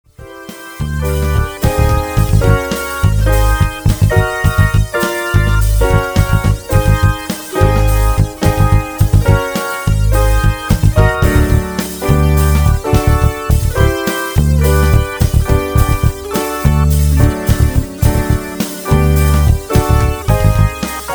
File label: fade